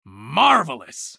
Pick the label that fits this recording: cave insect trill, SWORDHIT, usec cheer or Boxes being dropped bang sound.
usec cheer